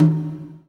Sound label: GATE TOM, Drums Acoustic